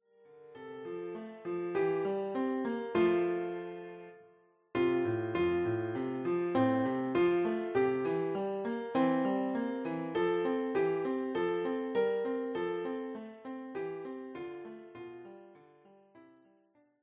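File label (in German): Adeste fideles, Klavier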